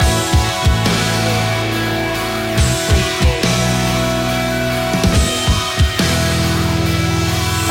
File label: the race loop